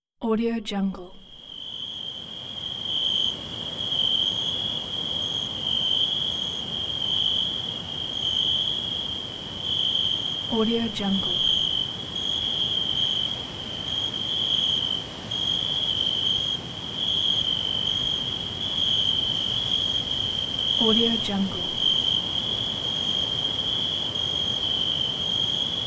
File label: quiet night; capeaudio